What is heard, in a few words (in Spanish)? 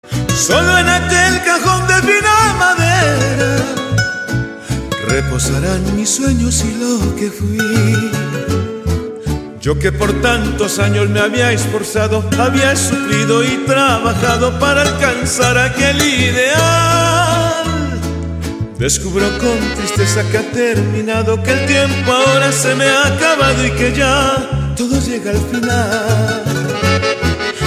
cajon de fina madera